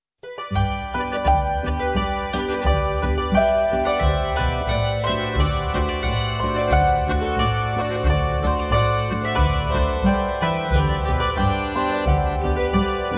schuetzenpolka

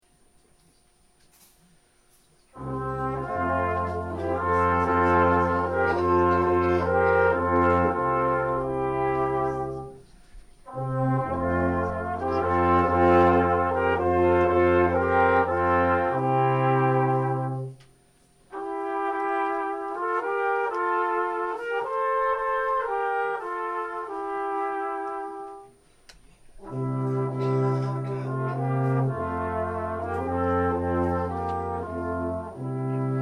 Weisenblaser

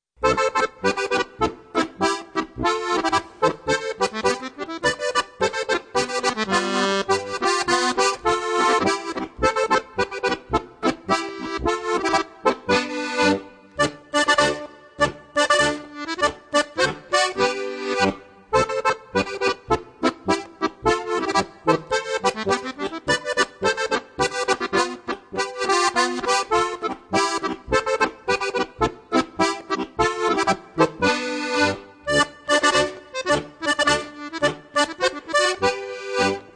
okarina marschl

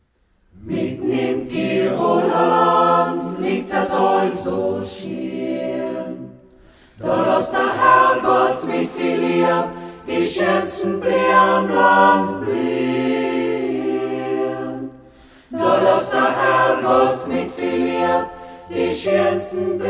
Gemischter Chor; Sautens

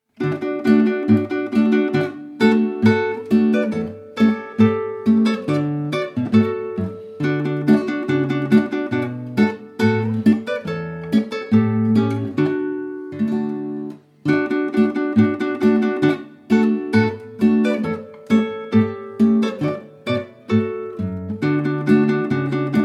Floriani Boarischer

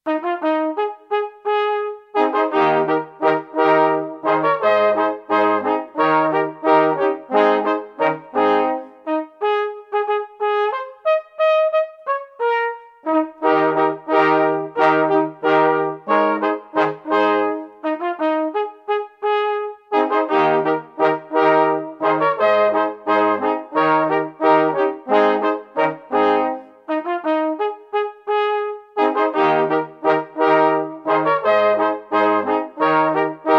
Da Waldtauba Volksweise

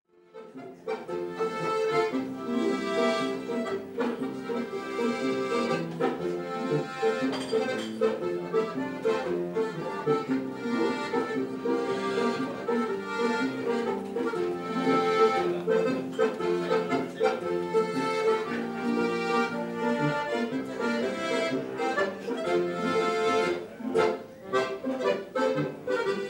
Berglandpolka; Worgl

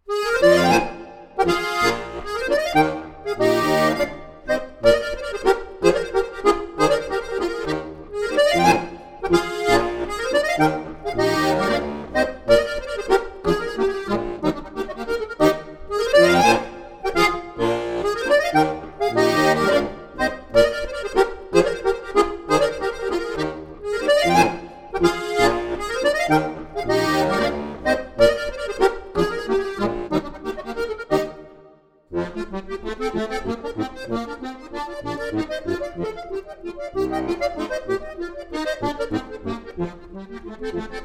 Figaro Landler

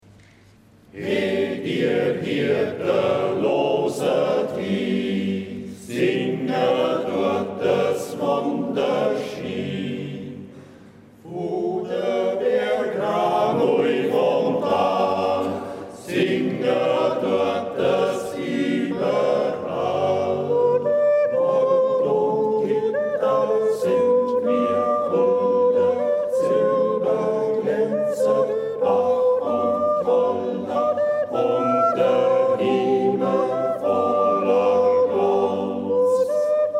Jodlergruppe Allgauer Hirtenlied; Niedersonthofen

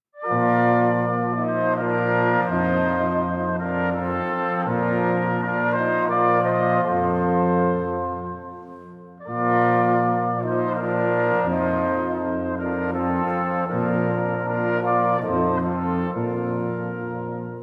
Blaser

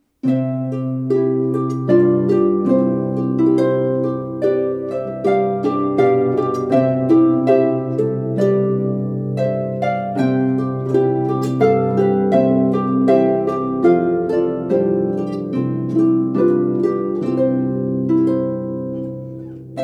Menuett; Mozart

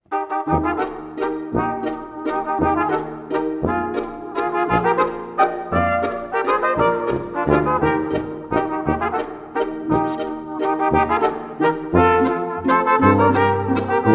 Wolfgangi Walzerl

